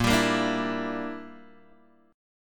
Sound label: A sharp 6th Flat 5th, Guitar DADGAD